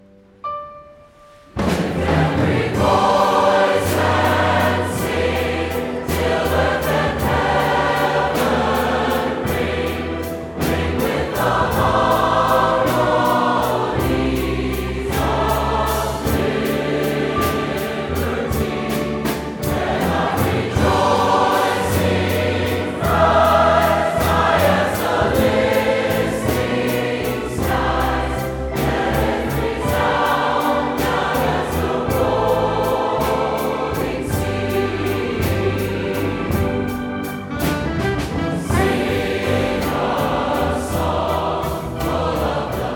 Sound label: Mixed Chorus; One Voice Mixed Chorus